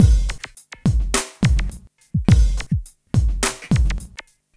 Smooth, R&B Drums

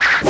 SWISH SEQ, swishes; Total Control - Action Cutz

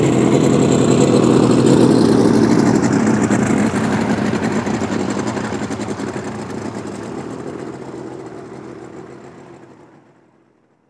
go, boats; AKAI S-Series CD-ROM Sound Library VOL-6

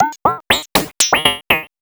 POLYMOD; AMG - Vince Clarke Lucky Bastard

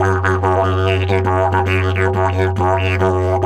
F 140, DIDGE RIFFS

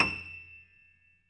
piano; East West; The Ultimate Piano Collection

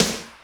Snr Torqued, Alesis D4 drum machine

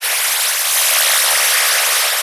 Sci-Fi, Classic SFX, cartoon; musicradar; cartoon caper

